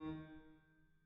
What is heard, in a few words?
harm D#3, Grand Piano; bitKlavier Grand; Piano Bar